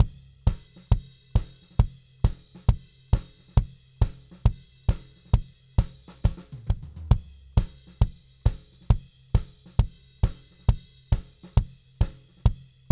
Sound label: Kick Chorus